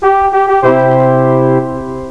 Win Combat; Siege of Darkwood 1.0

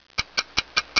MINE TICK

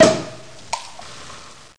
champ; Apple Expo 95

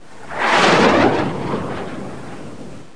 missle; Civil War Collection (American Civil War); Interactive Magic

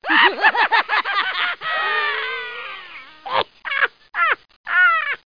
Hyenas Laugh Long

